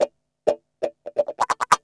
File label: GUI, LICKS; 130 LICKS